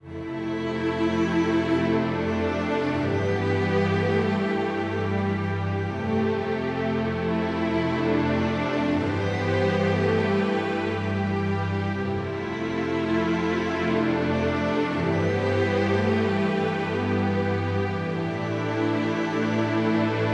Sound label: Menu Music